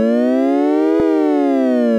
tuut

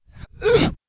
swordman attack; megaglest

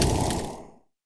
battlemage hit; sfx; pow; khanat

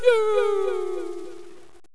carpet die; megaglest

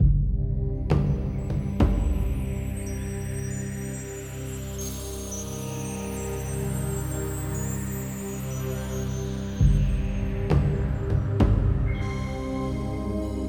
Loading Music Loop

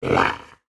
monster, animals, monsters

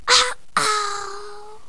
genie die; megaglest

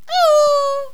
princess die; megaglest

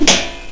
warrior attack; voices

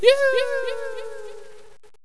carpet die; megaglest

